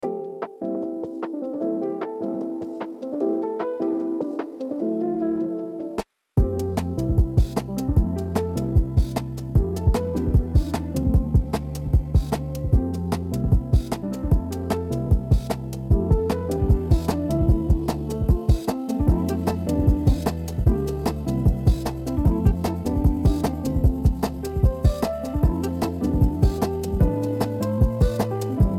Ambient Garden

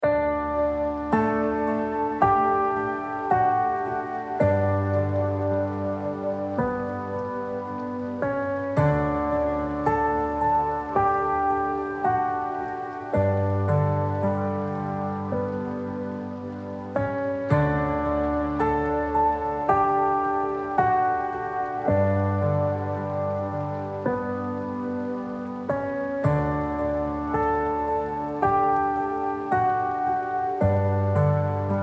Lullaby, Meditation Music; Legkoe Zasypanie

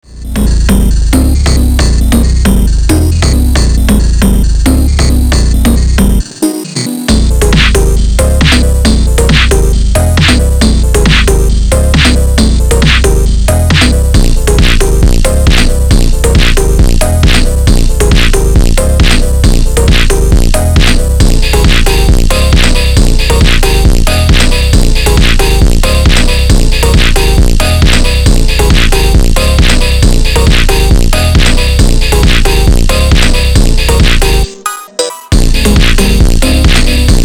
FONK SLOWED REVERB; FONK DLIa STRIMA

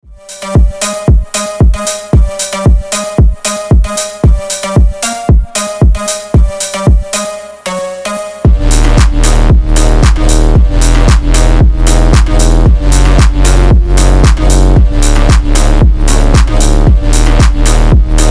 FONK

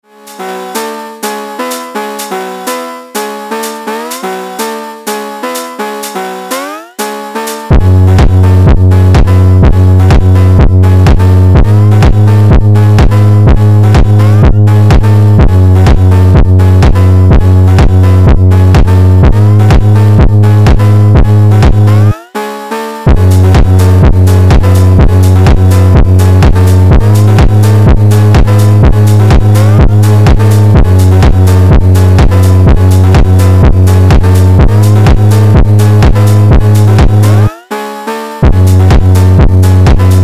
fonk

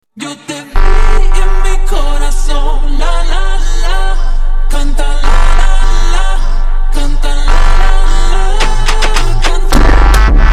Brazilian Fonk

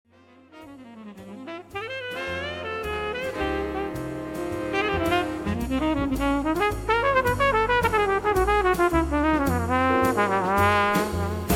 Soft Smooth Jazz - Smooth Jazz For Relaxation; Priiatnaia Laundzh Dzhaz

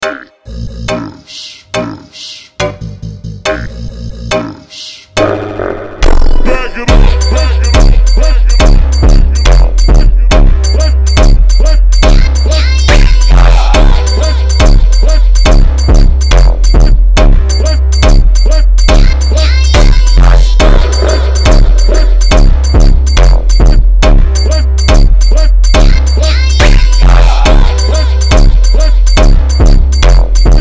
Brazilian Fonk

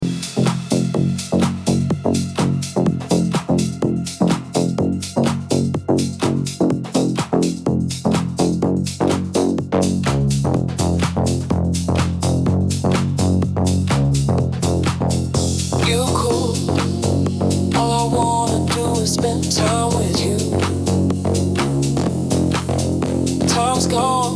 Deep House, Electro Sound

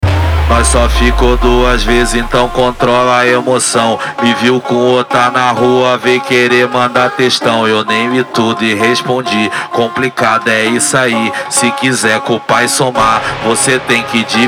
BAILE PHONK

